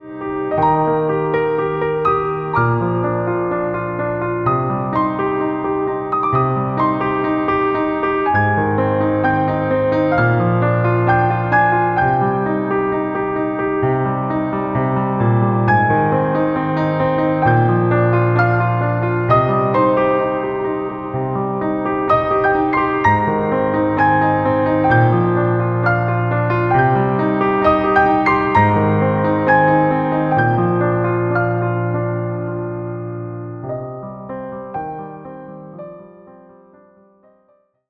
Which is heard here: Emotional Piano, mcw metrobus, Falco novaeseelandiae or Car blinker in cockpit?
Emotional Piano